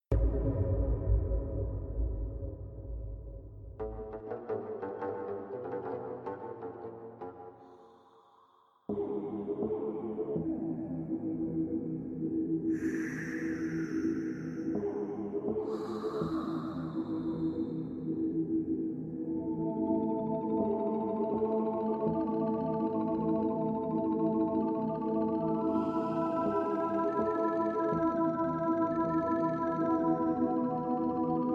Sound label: ocean temple, ambience music; PhoenixCraft 1.16.5